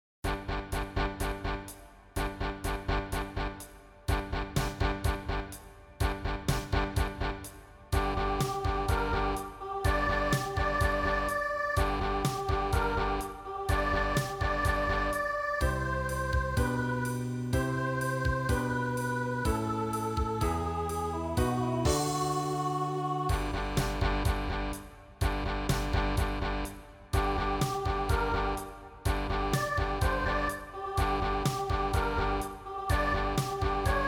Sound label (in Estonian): duett